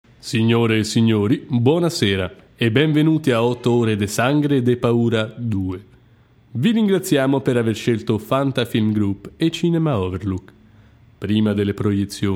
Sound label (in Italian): baritonale